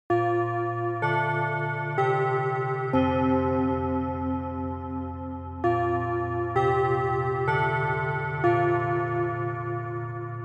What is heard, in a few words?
Japanese School Bell Long